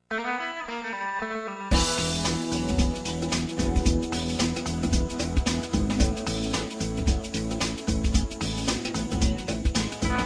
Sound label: Ocean Shores Music